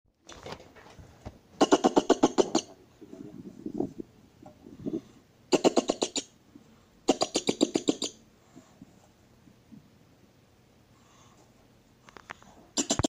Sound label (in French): Vison Europe cri